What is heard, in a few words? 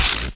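lightning gun hit; gusanos promode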